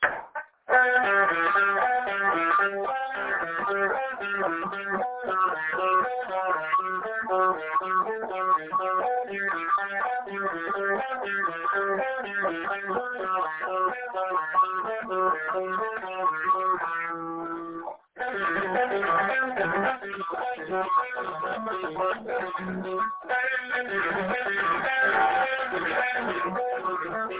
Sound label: metal solo